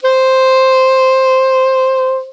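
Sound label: saxophone soprano C octave 1 vibrato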